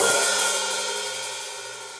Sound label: Hihat Open Shank Dark Plate Verb; Drum Hits; Standard Hits; Ableton; Factory Packs; Session Drums Club